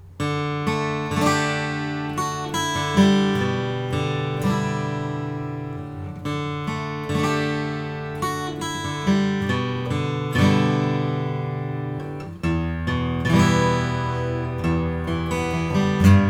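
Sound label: santa cruz om grand sitka mahogany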